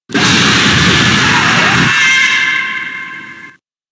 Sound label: jumpscare sound; fnabh 6